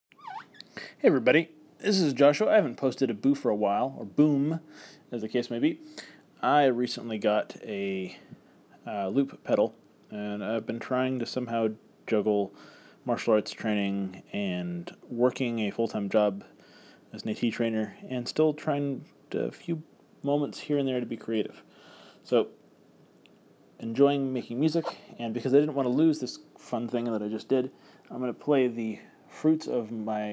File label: guitar with a loop pedal; fun times